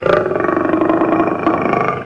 die lion; RYL

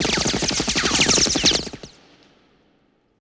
Sub-Machine Gun Ricos Multiple; Sony Pictures Sound Effects Series; Ricochets - Hits - Whiz Bys

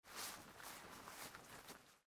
Footsteps From Right; Age of Empires III; cinematics

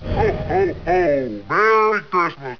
santa ho ho; RYL

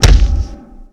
Mega Kick, Hand To Hand Combat; Sony Pictures Sound Effects Series

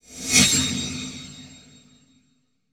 Flash Frame Heavy; Title Sequence Effects; Sony Pictures Sound Effects Series

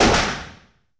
Pistol Shot 9mm Semi-Auto; Weapons & Firearms; Sony Pictures Sound Effects Series

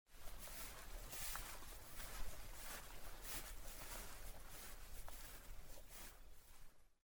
Walk; Age of Empires III; cinematics

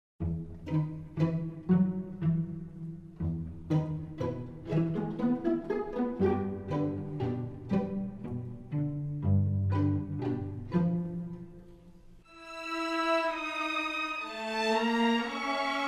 Music

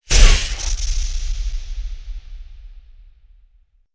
Flash Frame Crackle, Sci-Fi; Sony Pictures Sound Effects Series Volumes 1-10; Sony Creative Software

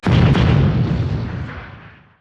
battle; RYL

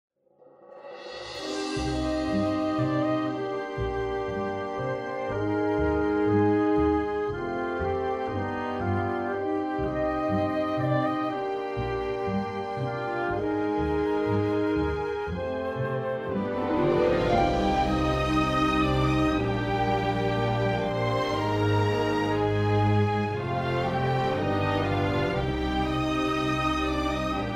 Music

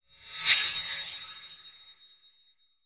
Flash Frame; Title Sequence Effects; Sony Pictures Sound Effects Series

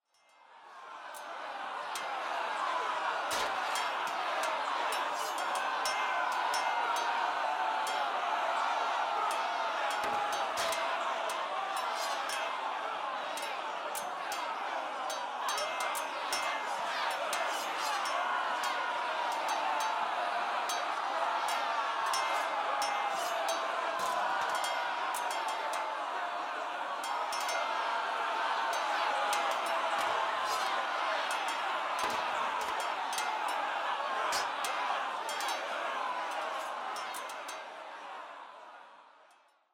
Ambient; Microsoft Studios; Age of Empires III - Complete Collection; cinematics

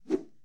Arm Whoosh, Hand To Hand Combat; Sony Pictures Sound Effects Series

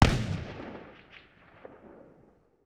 Musket Single Shot Distant; Period Combat; Sony Pictures Sound Effects Series

